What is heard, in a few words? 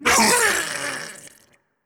Small Death